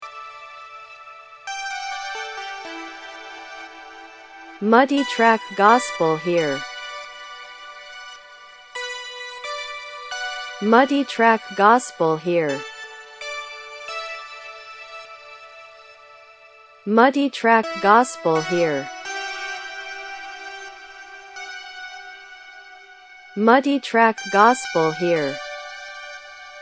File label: Keys Piano FX; gospel